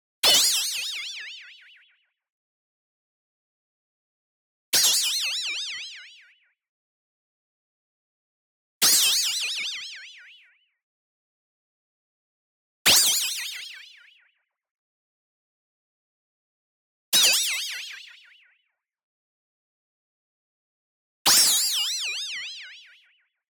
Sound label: zap, shifted metal cup; boom library; Anime Essentials; DSGNMisc